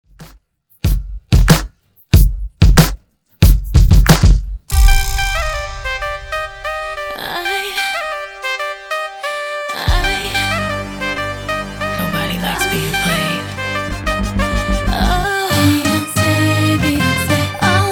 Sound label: 93Bpm; Redrum